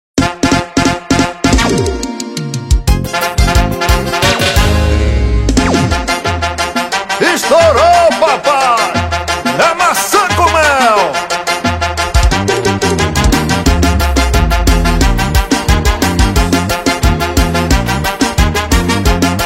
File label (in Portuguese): piseiro forro